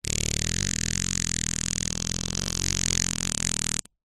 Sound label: human fart; zapsplat